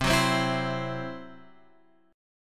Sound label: C-Major Flat 5th, guitar; CMb5 Chord {8 9 10 9 X 8} strummed